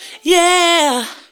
"yeah", female vocals; PlatinumPhattCD2; E-MU Producer Series Vol. 8 – Platinum Phatt

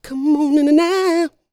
D BIT, Vocal R&B Dance

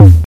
TOM, Drums Dance & Groove

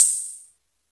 HiHat, Roland R-70

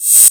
rev cymbal

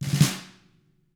TAMA, Drums; ILIO; Double Platinum Drums 1

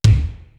WET KICK; PROC.BDS; East West; Global Sounds

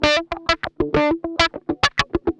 GUI, 100 BPM

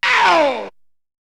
Vocal; ZERO G - Datafile DISC 1

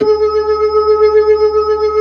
B-3, Blues, Keys of the 60s and 70s; Roland LCDP10 Keys of the 60s and 70s 2

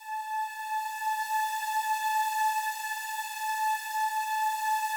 slow strings A4, Yamaha VP-1; 90 s Sample CDs; Music Store

